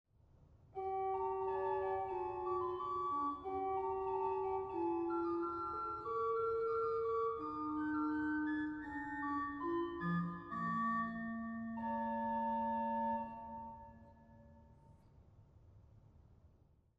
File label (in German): Rohrfloete 4; dortmund; Sauer